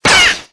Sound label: Orange Ball Spike; Cookie Run